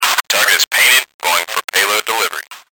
radio air

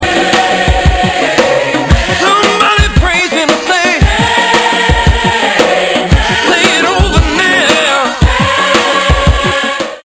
amen

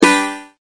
guitar

